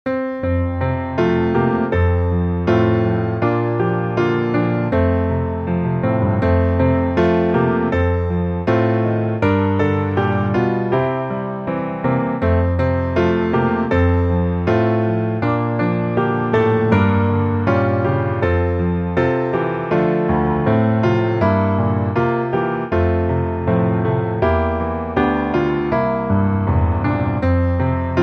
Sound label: piano